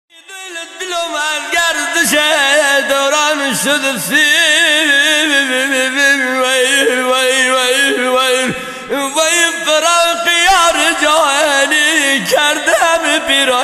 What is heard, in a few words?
music sharveh dashti